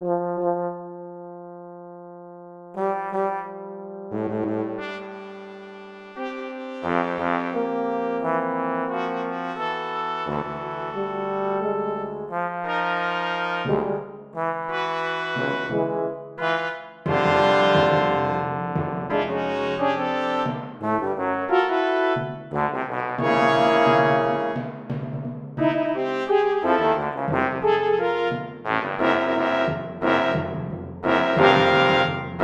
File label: Fanfare, Brass; Computer Recording; Standard Brass Quintet plus a Horn in F and Timpani; William Wieland; Trumpet 1 / Trumpet 2 / Horn 1 / Horn 2 / Trombone / Tuba / Timpani